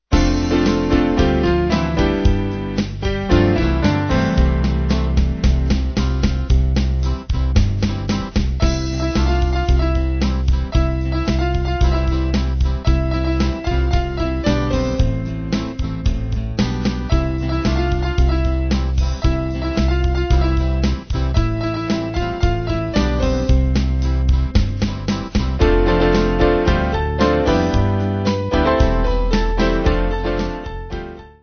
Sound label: Band